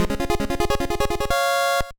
Unknown Jingle; sangokushi eiketsuden gba

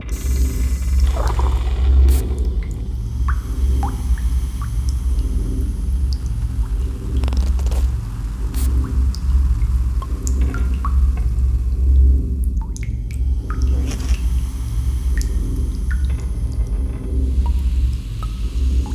Ambient The Crypts; rayman arena

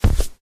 Jump; Mo Genie Balerina

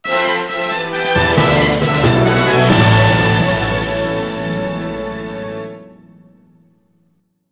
Jingle C; Champion Jockey: G1 Jockey & Gallop Racer; Xbox 360

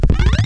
BLiNKiNG

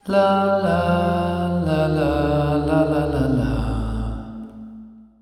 Title Screen Stinger; The Binding of Isaac Repentance

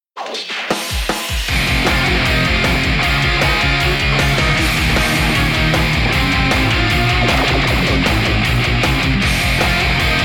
Melodic Metal; Saber Rider and the Star Sheriffs

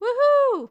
woohoo Female; Temple Run

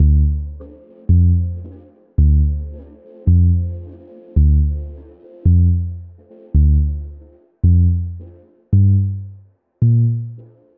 slow bass

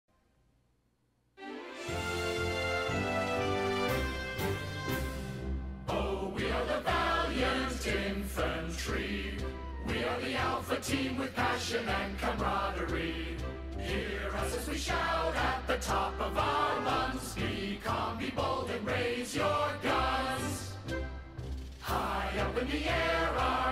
Infantry Song (English version); Earth Defense Force 5

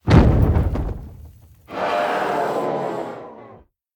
Thud Ghost Scream; The Town Intro Sequence 04

